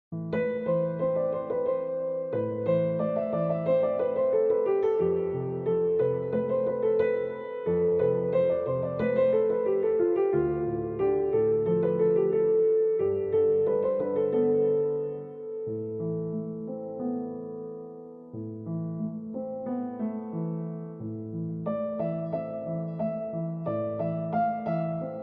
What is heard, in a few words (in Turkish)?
Piyano